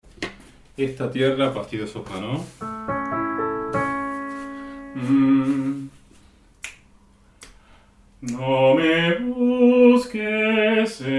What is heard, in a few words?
Soprano